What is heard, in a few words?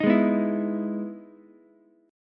E Major 7th E down Ukulele; EM7 strummed; JGuitar